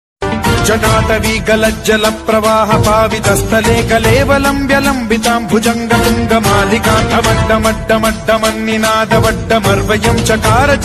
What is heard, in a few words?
Ringtone